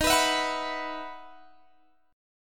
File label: E Major 7th Suspended 2nd